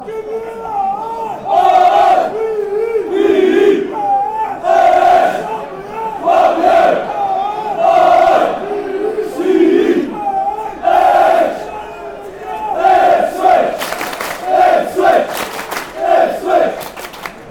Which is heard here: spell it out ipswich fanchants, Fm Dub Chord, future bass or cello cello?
spell it out ipswich fanchants